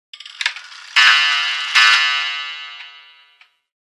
Alarm, phonetones, Nokia 5500 Sport

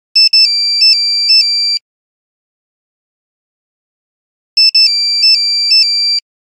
Nokia Buzzer City bird; Windows Phone apps

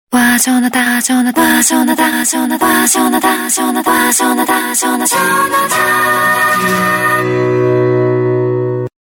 Wow Call, Ringtones; LG SV770 Waffle Prototype

